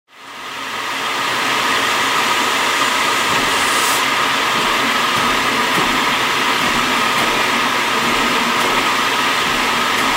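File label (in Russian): подача топлива паровоза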